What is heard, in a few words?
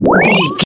retro powerup collect